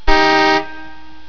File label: car horn; beemer